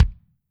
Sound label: kick, drums percussion; MegaPatchDrumKit